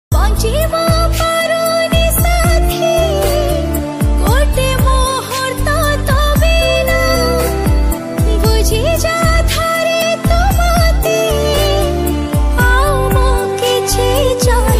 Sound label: Female version